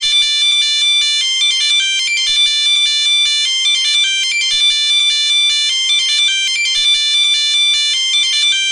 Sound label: Snoozer